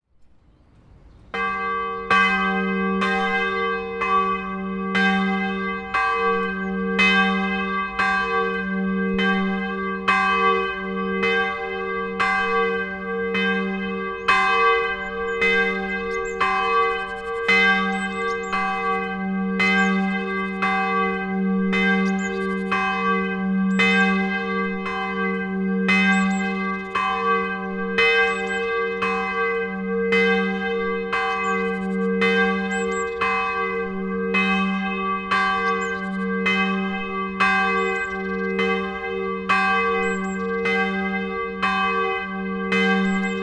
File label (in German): glocke; friedhofsverwaltung